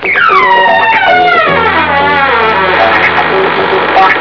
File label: creak; Creaky Noise Made By The Vinyl Vault; Mark Radcliffe and Lard